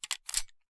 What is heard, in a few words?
ar reload